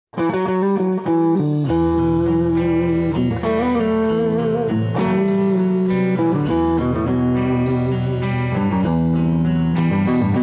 Gibson R9 Custombuckers

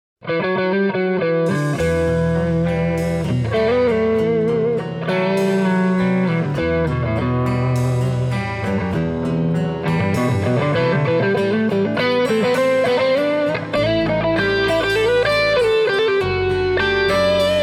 Gibson LP Special P90s

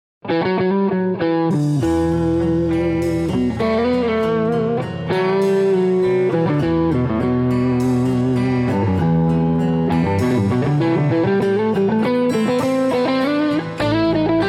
Heritage H150 Montys

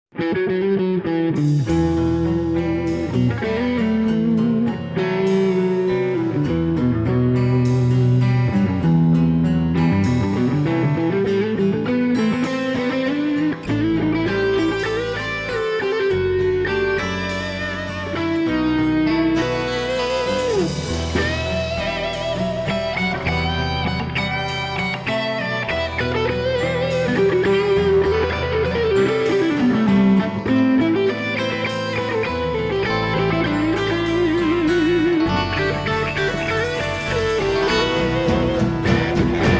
Heritage H150 Creamtone

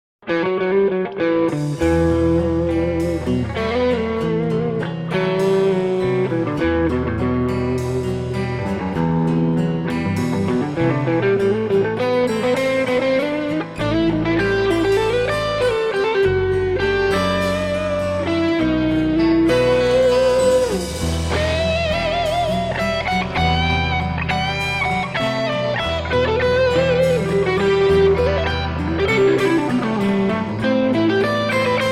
Heritage Oscar Bare Knuckle The Mule

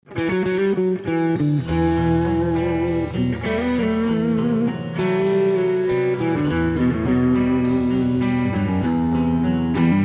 Heritage H150 Brandonwounds

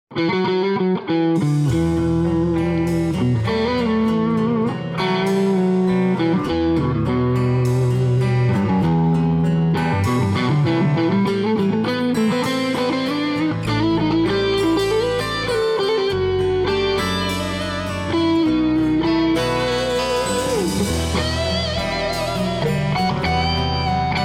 Gibson LP Special P90s

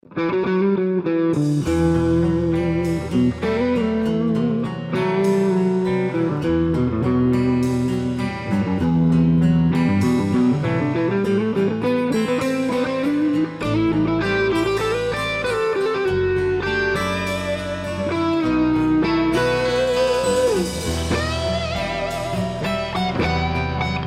Heritage H150

